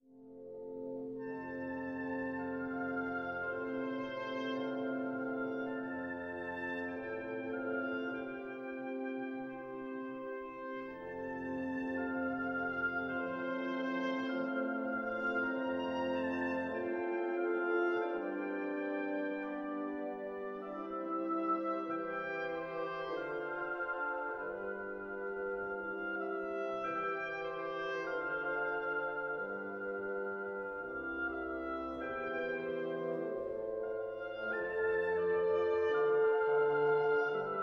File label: Oboe